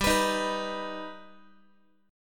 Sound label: G Augmented G Guitar; strummed